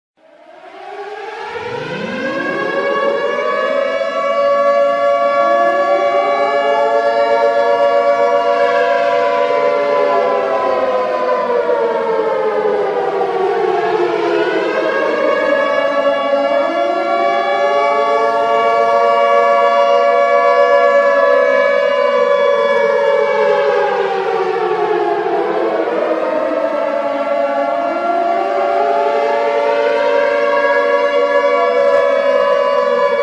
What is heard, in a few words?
nuclear air raid siren